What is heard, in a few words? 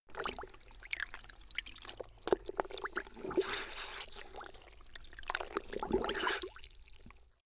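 cloth wring out, household; haus-garten; haushalt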